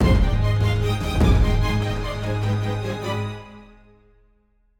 battle, Orchestral Background Music; Epic Adventure

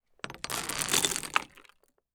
Sand Pebbles; TOOLKIT vol.2

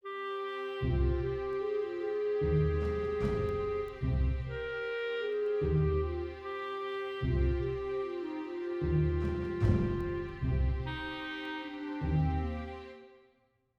exploration, Orchestral Background Music; Epic Adventure

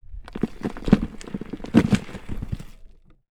Sand Pebbles; TOOLKIT vol.2

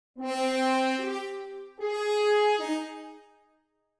melody, Orchestral Background Music, epic battle; Epic Adventure

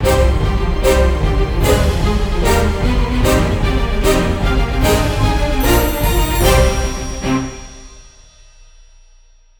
battle final, Orchestral Background Music; Epic Adventure

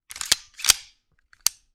Part Assembly; TOOLKIT vol.2

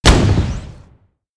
Hand Gun

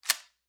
Part Assembly; TOOLKIT vol.2

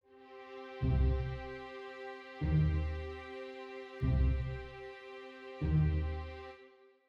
intro exploration, Orchestral Background Music; Epic Adventure

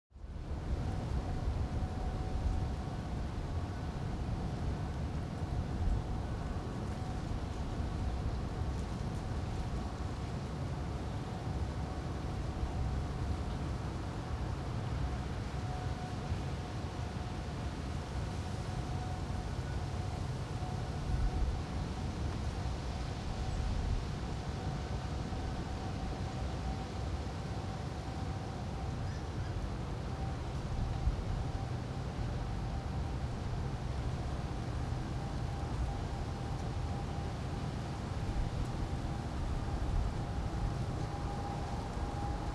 light rain atmosphere; mixkit; cozy

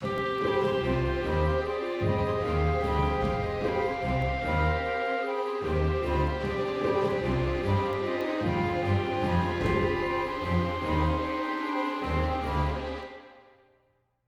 exploration, Orchestral Background Music; Epic Adventure

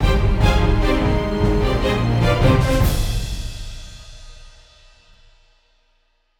battle end, Orchestral Background Music; Epic Adventure

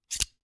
Part Assembly; TOOLKIT vol.2

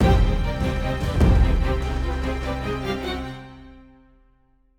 battle, Orchestral Background Music; Epic Adventure

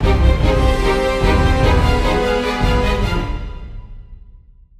battle, Orchestral Background Music; Epic Adventure

